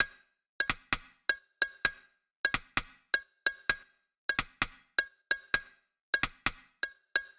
RimSh Loop 130, Perc Loops 130, Loungin House; Equipped Music

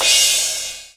Roland R-8 Crash

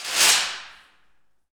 PRC ALMWA, Aluminum Wall; Roland L-CD701; FX Perc 1

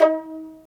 STR PIZ VL, String Vlns Pizz; Roland - String Master Series

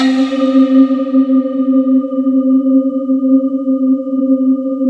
bowww C4

